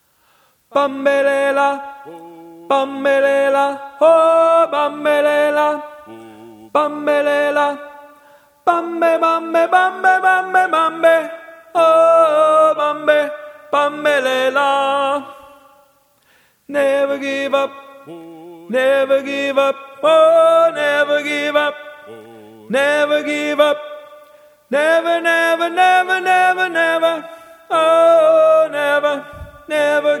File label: Bambelela alto, choir